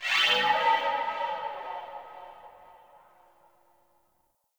Crp Ship Tech Flow Texture Med, Corpus Ship Ambience